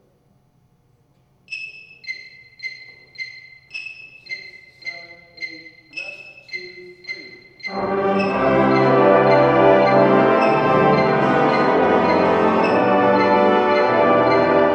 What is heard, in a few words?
brass; north star brass woburn ma